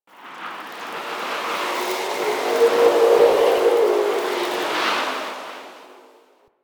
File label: Landscape Wind Gust Low; One Offs; Warframe; Venus Open World